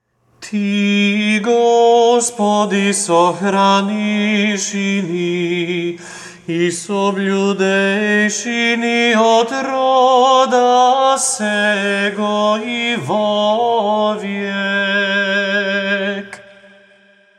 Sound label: tone 5, Serbian Chant; Vaskrsni Prokimen - glas 5